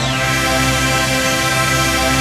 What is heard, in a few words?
PAD, Dance Mega Synth